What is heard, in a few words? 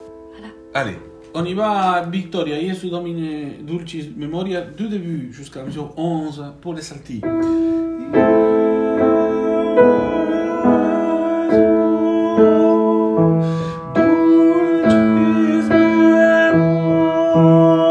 Jesu dulcis memoria alto; mesures 1-11; Victoria